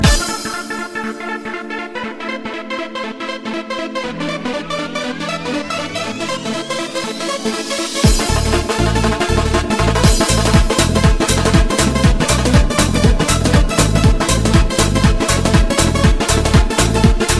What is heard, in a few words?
lezginka dagestan